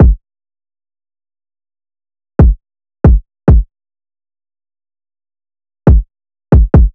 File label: Kick 138bpm drill dark